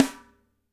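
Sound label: SN, drums; DRY KIT; Spectrasonics; Burning Grooves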